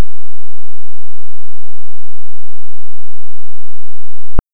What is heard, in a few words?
anthem